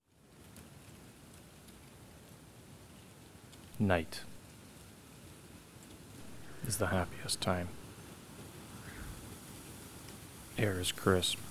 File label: Happy song minor key; Jamuary